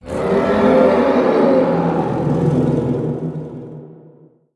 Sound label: roar distant